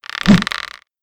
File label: swing; Ringling Bros. and Barnum & Bailey; Wii